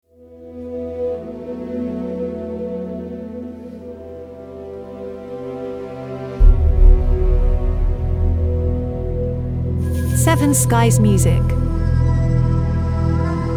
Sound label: Ambient Dark Film Series